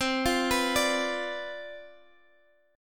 C Major 7th Suspended 2nd Suspended 4th, Guitar, Standard; arpeggiated; CM7sus2sus4; x,x,10,10,12,10